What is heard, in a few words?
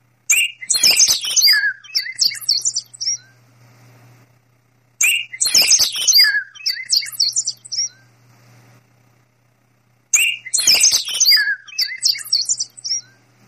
ring birds; htc